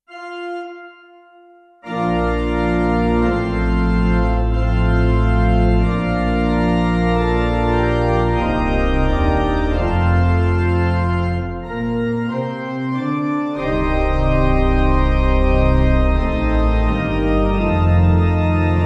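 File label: Pipe, Pipe Organ; Our Father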